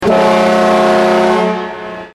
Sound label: horn